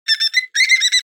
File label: Dolphin calling; Fish and Aquatic